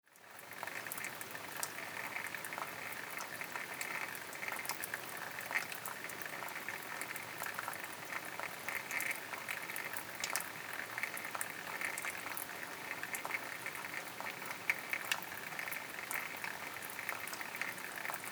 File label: dripping with river in background